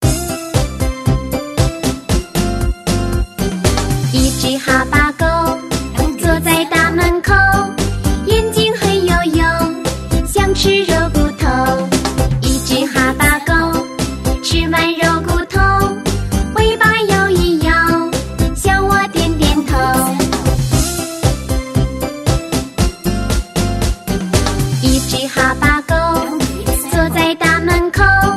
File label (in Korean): 중국여 동요